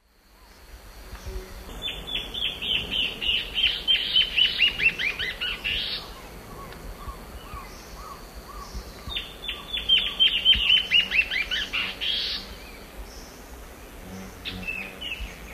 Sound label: Hypocnemis peruvianus, duet; S. America; Eastern Lowlands